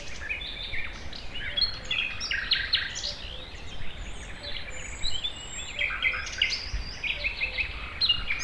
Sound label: grove; Mafie 1